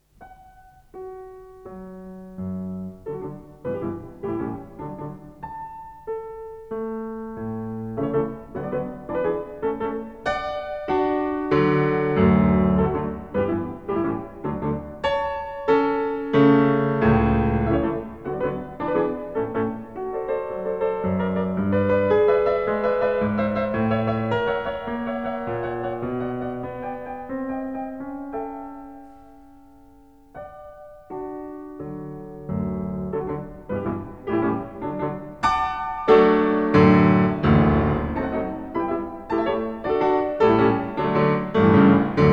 Sonata in D major, Scherzo & Trio: Allegro vivace, piano; Beethoven; Op. 28 "Pastorale"